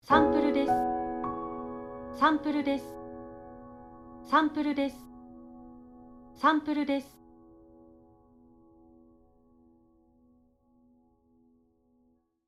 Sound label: Piano